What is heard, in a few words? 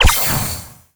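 frisbee fly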